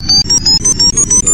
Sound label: timer increase